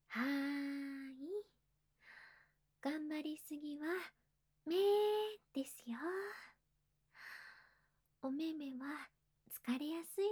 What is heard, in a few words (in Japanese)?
耳キス, ASMR; いのりママ; R18おまけ